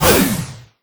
deadringer charge; Crypt of the NecroDancer

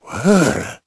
confused; Crypt of the NecroDancer

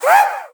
mommy spawn prep; Crypt of the NecroDancer

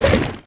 tarmonster attack; Crypt of the NecroDancer

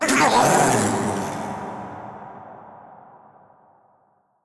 death; Crypt of the NecroDancer

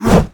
ogre upswing; Crypt of the NecroDancer

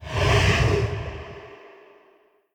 sarcophagus shake; Crypt of the NecroDancer

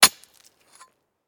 axe mining ore; Factorio

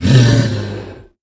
minotaur death; Crypt of the NecroDancer

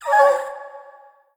ghast attack; Crypt of the NecroDancer

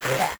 skel hit; Crypt of the NecroDancer

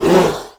ogre attack; Crypt of the NecroDancer

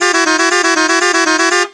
horn; TrackMania Nations Forever; Skins

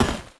dig brick; Crypt of the NecroDancer

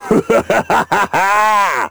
dorian laugh; Crypt of the NecroDancer

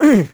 hurt; Crypt of the NecroDancer

